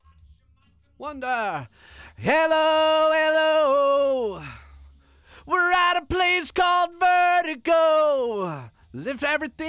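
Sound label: vocal; Pro Tools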